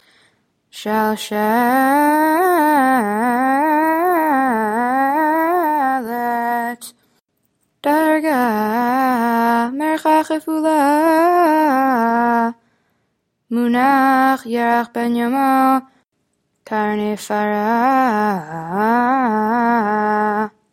polish lithuanian torah other taamim

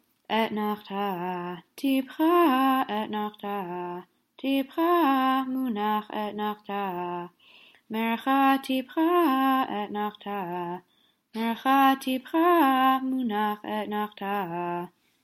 polish lithuanian esther אתנחתא

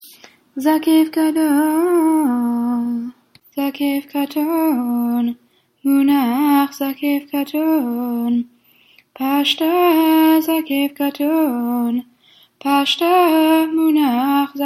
polish lithuanian regalim זקף

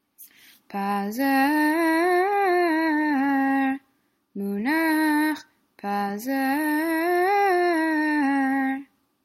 polish lithuanian haftorah פזר

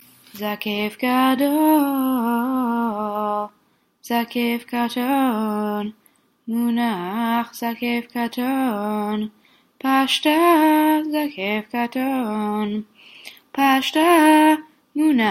polish lithuanian eicha זקף

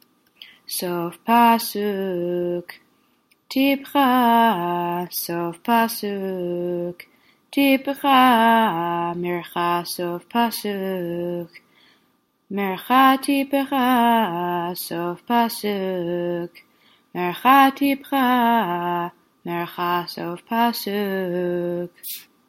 polish lithuanian eicha סוף פסוק

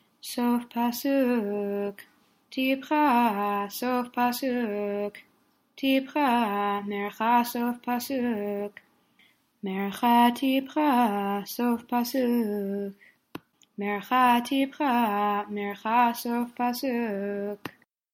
polish lithuanian haftorah סוף פסוק

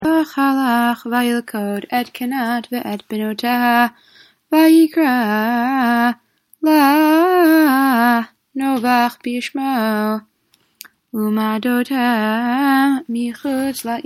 polish lithuanian torah other taamim examples

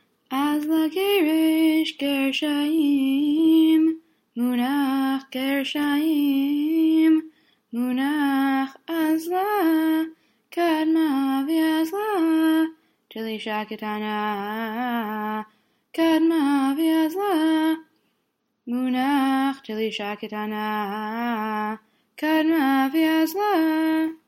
polish lithuanian esther אזלא

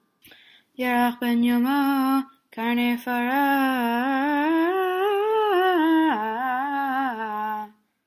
polish lithuanian esther קרני פרה

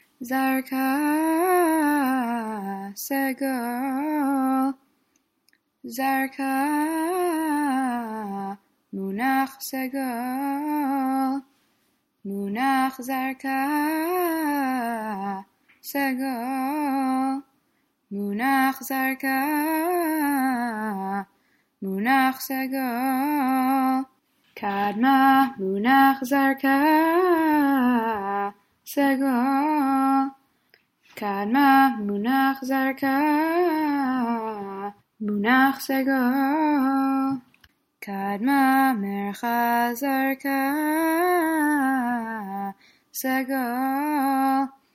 polish lithuanian haftorah סגול

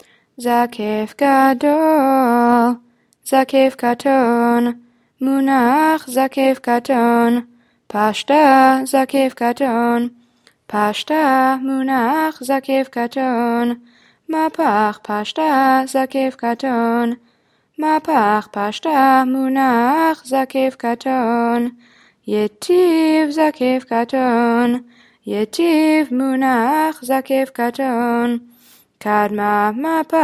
polish lithuanian torah זקף

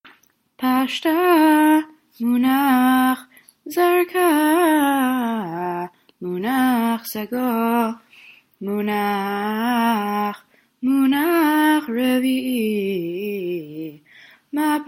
polish lithuanian eicha taamim

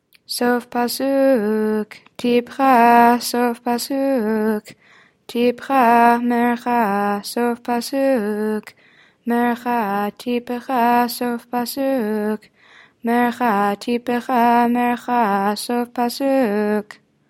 polish lithuanian torah סוף פסוק

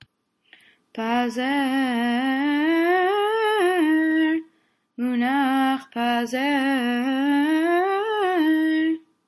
polish lithuanian esther פזר

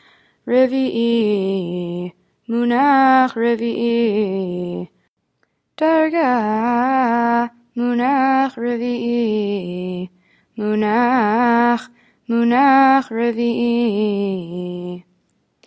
polish lithuanian torah רביעי

